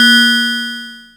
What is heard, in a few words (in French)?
Cloche; techno acid samples